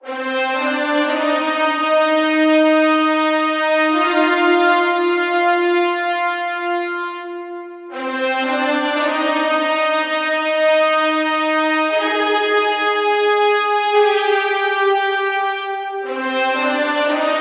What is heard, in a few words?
Horns - Cm 120Bpm; Ghosthack; SkyRealm; Kit 23 - Victory On The Reach